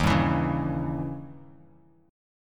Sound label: E flat 7th Flat 9th Bass, chord; Bass Standard; Eb7b9 strummed; JGuitar